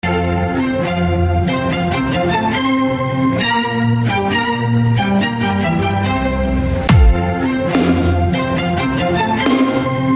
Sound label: dubstep